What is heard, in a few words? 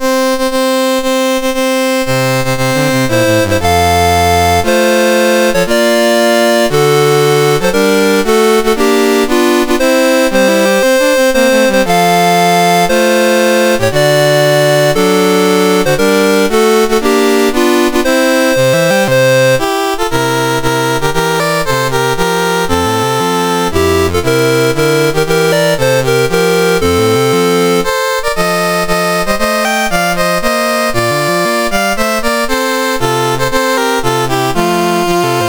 MOS6581R2, National Anthems; Dave Marquis; Peru